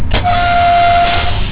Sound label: klaxon, Train; OpenBVE